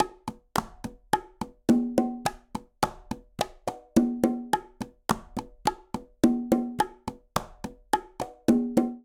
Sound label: Charanga Cha-cha-cha Conga Bongo-106bpm; Latin Percussion; Ableton; Factory Packs